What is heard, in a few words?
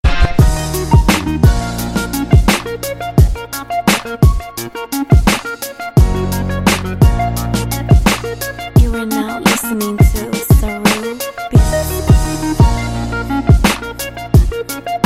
Nice beat